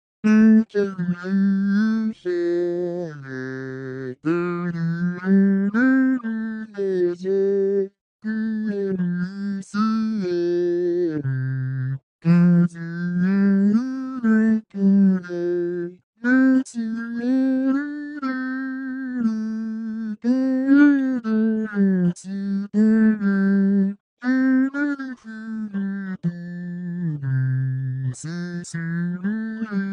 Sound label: Guitar C2 D3